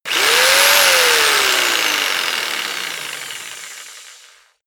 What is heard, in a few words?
jigsaw engine fast medium length; maschinen; saege; soundpool